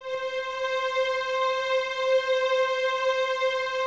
violins C 5, String Ensembles, 23 Violins; Miroslav Vitous

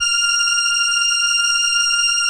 ELKA, String Machines

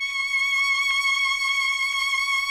CLN STG D5, CHAMB STR; Sonic Reality; Vintage Timetraveler

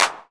PERC 909; Electronic; Wizoo - Platinum 24 Electronic

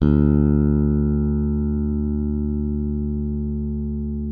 D2, FINGER MUTE; Xsample VOL-1

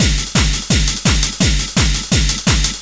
LOOP, Eurotech; Sounds Good

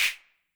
HAND CLAP, 909 Reverb Pitch

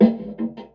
Layer Snippet, layering samples Drum Bits

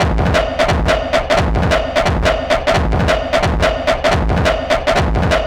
Rugged Break, Drum & Bass, Beats & Breaks; Fluid Dynamics

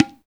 PRC TBLAHI, INDIA PERC

